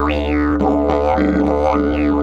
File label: 108, Didgeridoo; Big Fish Audio - Didgeridoo and Other Primitive Instruments